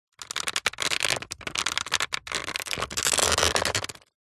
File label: plastic creak stress; foley